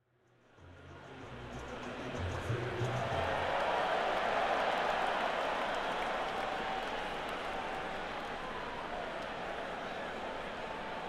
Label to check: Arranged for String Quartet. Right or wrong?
Wrong